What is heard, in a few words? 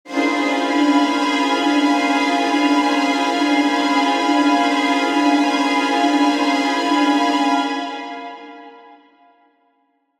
Chase Strings High, Horror Strings